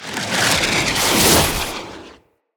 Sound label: Siege Engine Cannon Projectile By; Ode Siege Engine